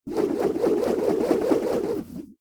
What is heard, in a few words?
Boomerang flying whoosh; cartoon